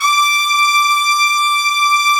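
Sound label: trumpets section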